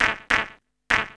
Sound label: Burning Grunge Hip Hop, RNB 100; USB Soundscan vol.34; AKAI